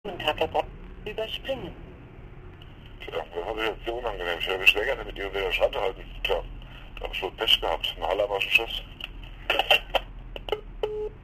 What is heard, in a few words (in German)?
Telefon